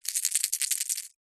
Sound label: foley gemstones several small opal amethyst jade shake in hand